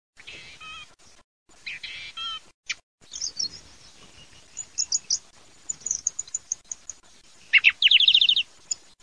TORDO

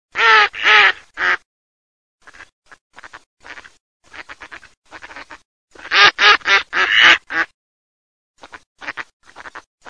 GERMANO

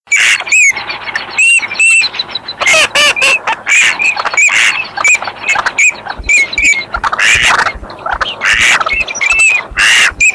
germano alzavola

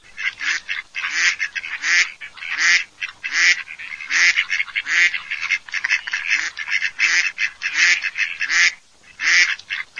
GERMANO

